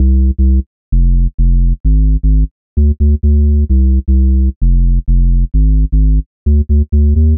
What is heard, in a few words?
Bass Loop 130 Cm; Bass Loops 130bpm; Downtown House